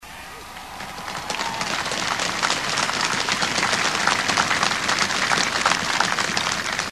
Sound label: applause